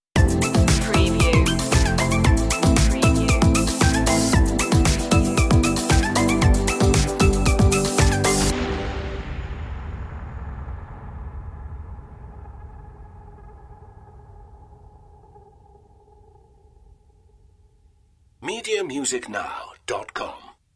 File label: party stinger; media music now